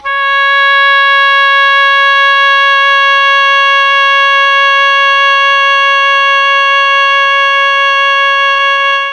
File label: C#3 OBOE, Mellotron; CHMBLN OBOE; Mike Pinder - Mellotron Archive CD